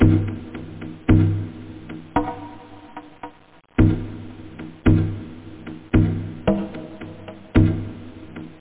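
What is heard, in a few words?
conga, loop, percussion; da capo vol1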